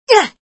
Fem Pain; Extra Lives

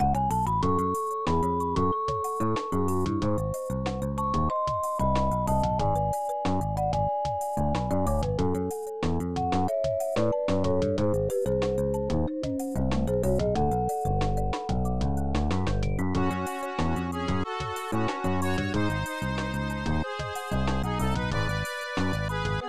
Cave; madou monogatari; snes

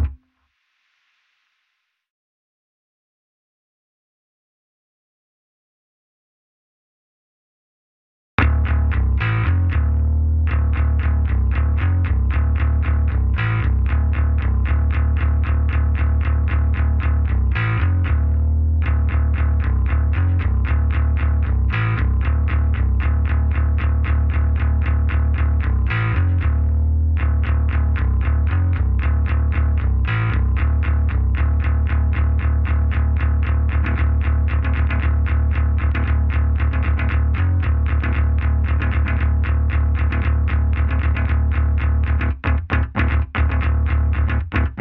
Bass; nowhere station; Instrumental

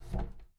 Door Close; miside demo windows gamerip 2023

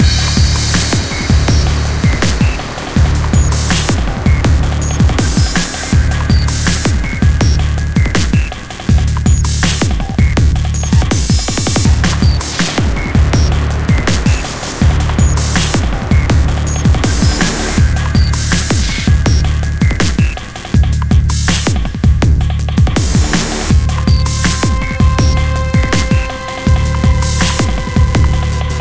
Combat; mirror's edge gamerip

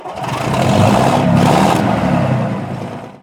tractor Engine Start

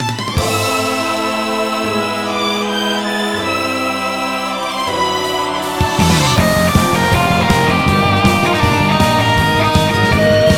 Battle intro; vivid knight switch windows gamerip 2021; Nest Battle Big intro

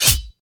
white spikes appear; Hollow Knight

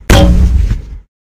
Granate Gun

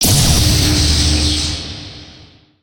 Electro Start; bejeweled 2 deluxe

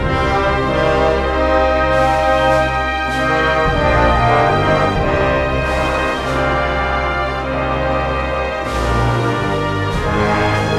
LOADING; brothers in arms earned in blood

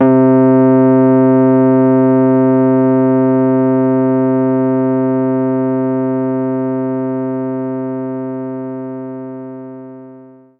Rhodes C2; knights try

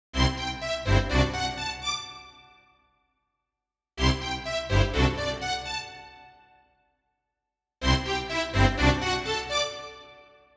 Day (w-o Ambience); Donkey Kong 64; Fungi Forest

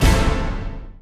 Final Battle - Combo Stab; Bee Movie Game

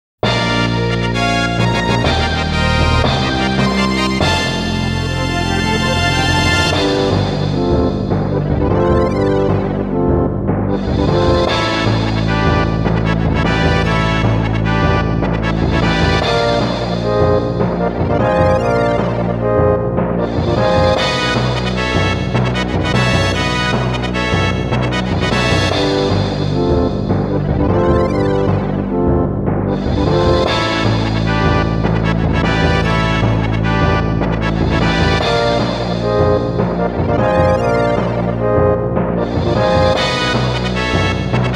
Level Up; bahamut lagoon

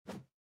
whoosh generic

